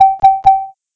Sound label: Speed Limit Violation Alert